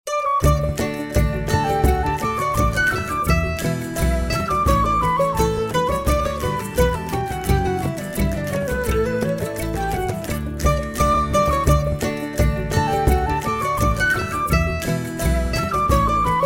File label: sailers hornpipe